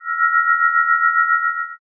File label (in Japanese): kyouki